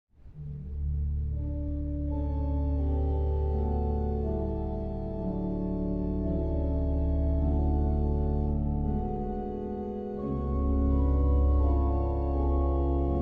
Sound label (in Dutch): Inleiding Koraal en Gavotte (hoofdorgel); Psalm 84